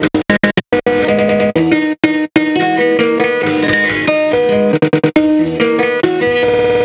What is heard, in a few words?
guitar